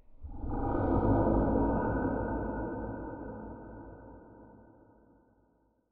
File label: Ambient; Barotrauma; Subsurface